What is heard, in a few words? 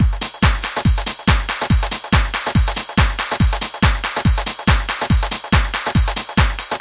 141 bpm swingy echo techno, drum loops and breaks; 141 to 160 bpm